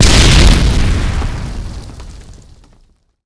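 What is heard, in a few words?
grenade explode; Explosions; Call of Duty Modern Warfare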